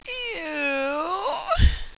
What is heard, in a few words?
"eww", sultry; pain